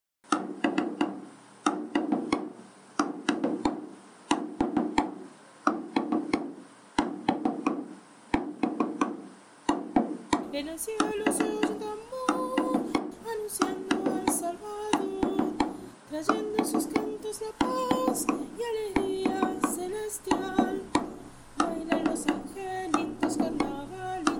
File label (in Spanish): Bombo y voz, Carnavalito; Carnavalito de los angelitos